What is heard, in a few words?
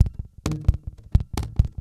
Brisk Walk